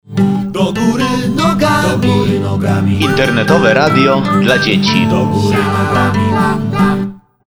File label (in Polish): Jingiel Radio